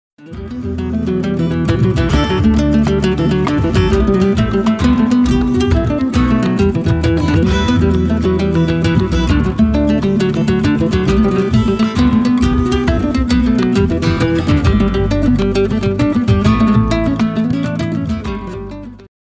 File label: grey goose, guitar